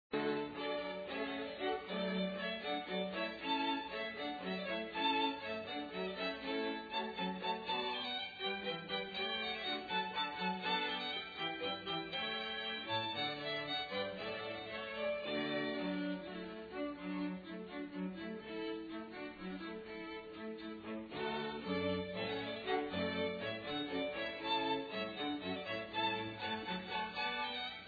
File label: hornpipe water music mono